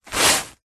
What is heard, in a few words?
household cleaning wiping carpet with dry tissue paper single wipe; zapsplat